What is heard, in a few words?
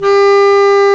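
Bass Clarinet; Roland L-CDX-03 Disk 1; Lo Clarinets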